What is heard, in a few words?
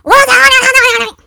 Lurker Startle